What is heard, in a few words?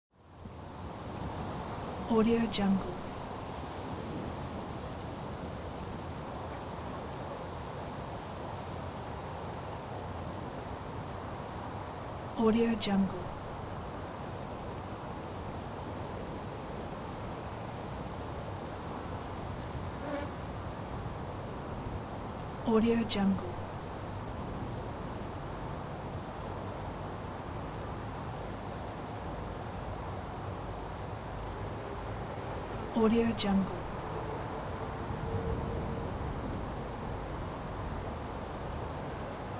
cicadas